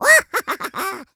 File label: laugh; smsunshine; shadow mario